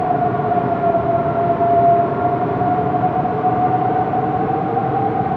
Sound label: idle loop; Lich